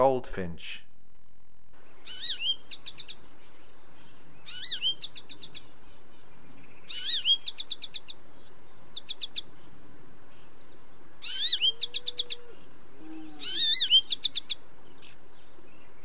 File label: Gold finch